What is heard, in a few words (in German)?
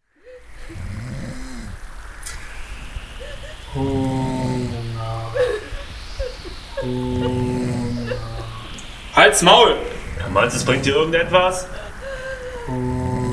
Hungerchor